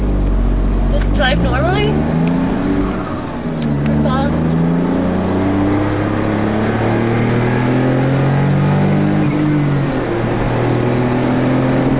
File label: roadster; rachels new smart roadster 2005